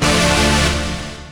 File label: chord A; Techno Trance Essentials; CHORDS